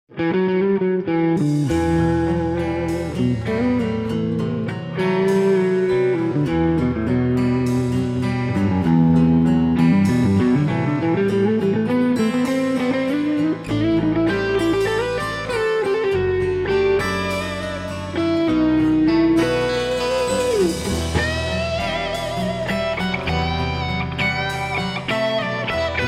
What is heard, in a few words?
Heritage H150 Doyle Coils Tru Clones